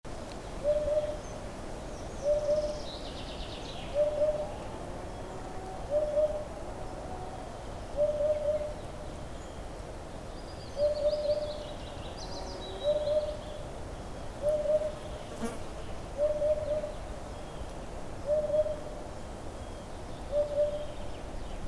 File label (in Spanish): Canto del ave abubilla Upupa epops